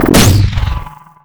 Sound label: weapon disintegrate; Half Life Model Pack; Half Life 2; ambient levels citadel